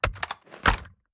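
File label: sniper reload end; gmod; iw8; xmike109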